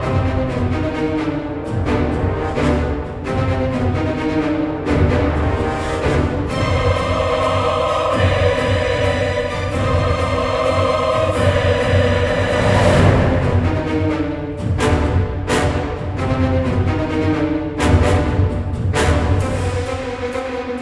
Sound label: Combat Loop; Starlight